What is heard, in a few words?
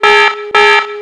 alert